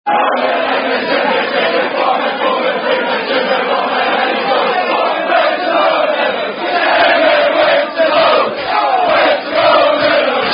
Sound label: Chelsea Song, Ten Men Went To Mow